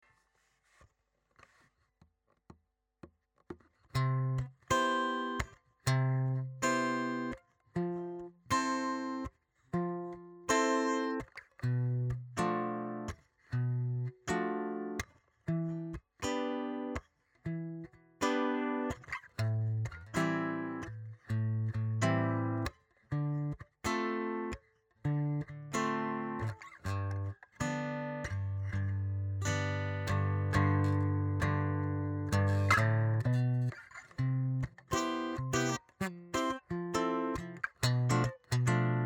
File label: Key C Circle of 4ths